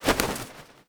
wings jump, crow; critters

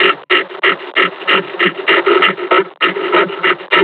panting, combine charger; Half Life Alyx

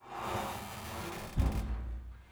metal stress; Counter Strike Model Pack; CS:GO; survival

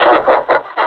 laugh, combine suppressor

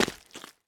mud, footsteps

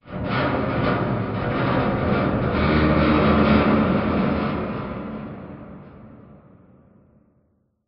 amb apocalypse wronk, ambient city

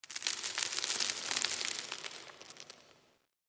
cavern excavation pebbles; ambient, rocks

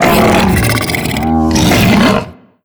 spit build, barnacle creatures; Half Life Alyx

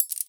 submenu dropdown option select, ui

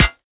metal prop, bullet impacts; physics; Half Life Model Pack

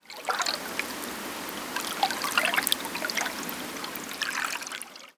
ambience canals water lap; Counter Strike Model Pack; CS:GO

